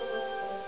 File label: Xmas Kiss Minimize; CHRISTMASKISS